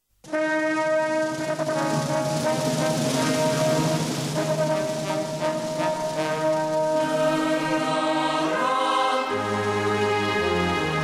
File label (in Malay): Lagu Kebangsaan Negaraku